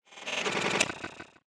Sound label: Tech, Weird Side Samples